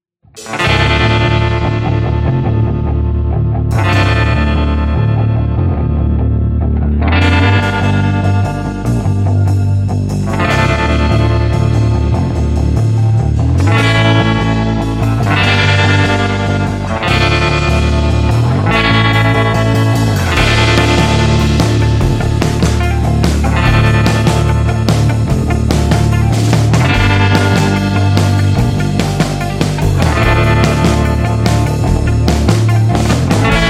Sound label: Surf Rock; sounds of the world